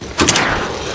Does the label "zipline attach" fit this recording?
Yes